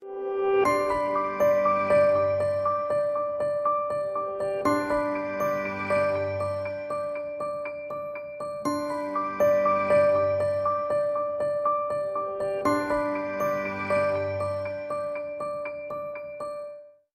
Delight, Ringtones; Cisco Collaboration

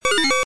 Folder Opened; ESAN VO Sound Schemes; 8 Bit Sound Pack V2

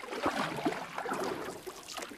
paddle; Golden Crayon Sounds

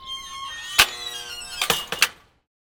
door close; Golden Crayon Sounds

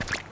wet concrete land; Golden Crayon Sounds

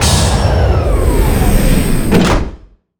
delivery stop; Original TK Sounds

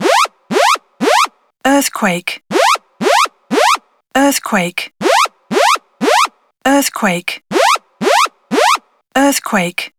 translated earthquake en; iOS 27

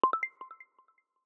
SOS Fall Detection Prompt Haptic

